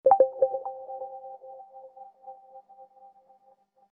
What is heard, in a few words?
magic click